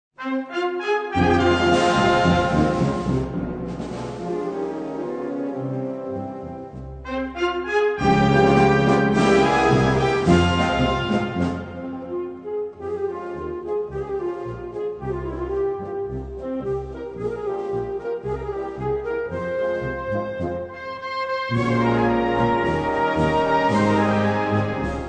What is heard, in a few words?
Clarinera Major